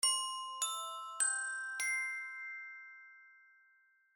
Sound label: Chime Announce